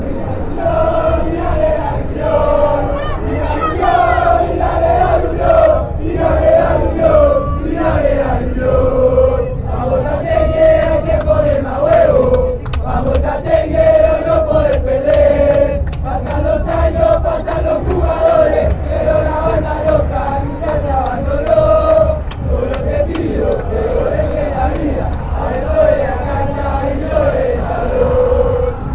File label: union chant